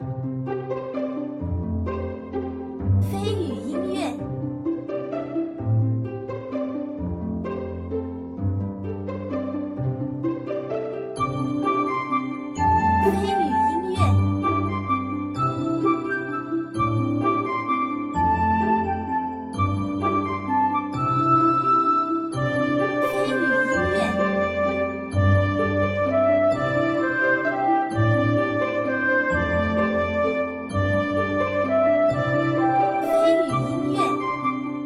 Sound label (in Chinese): 舒缓 幽默 优美